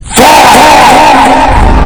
fah

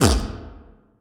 billy falling

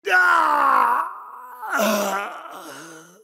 scream; mk9; raiden

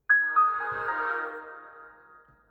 windows shutdown sound

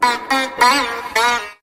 sitar sample

